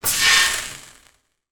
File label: water sizzle on hot metal plate; sound effects one